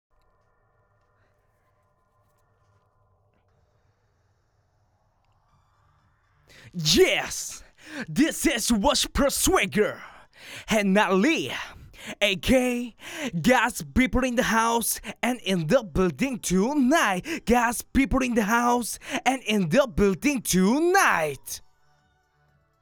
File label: rap male intro